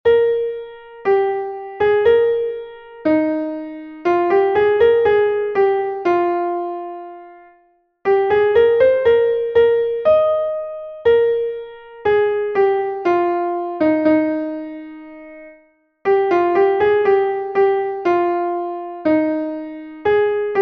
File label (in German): klavier; MelodieMeister